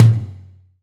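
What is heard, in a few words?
Sharp Low Tom 2, Roland BK-5 Toms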